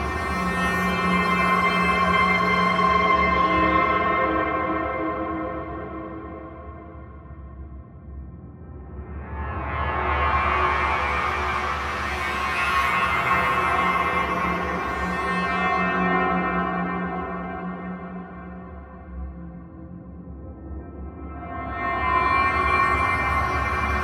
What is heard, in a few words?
Boss Ambience; Terracotta